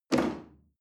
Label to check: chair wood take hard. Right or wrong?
Right